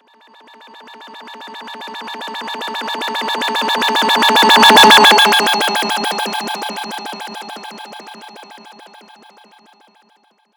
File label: Hover, Robot, Pass, By, Scifi; Sound Effects:Science Fiction; BlastWave